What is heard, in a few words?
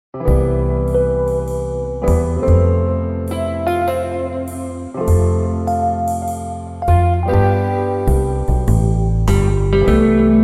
Happy Birthday to You Jazz Version; Singing Bell